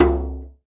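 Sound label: DUMBEK, Talking Drums; Roland - LA Composer vol. 1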